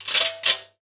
Cheese Grater, household rhythm samples Hits

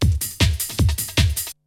BREAKBEATS, TECHNO; Syntec; Wall of Sounds; TECHNO-BOX

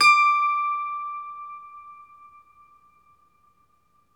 IRISH HARP

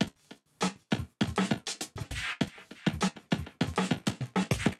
Ghost Loop 100BPM, drum loop; Ghost Layers; layering

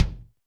Kik, Drum Machines, Alesis D4

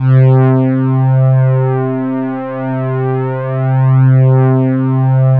C2, ANALO STRING; Sound Gallery - Charged

